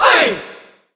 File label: crowd hey, jp; HeavenStudio; fan Club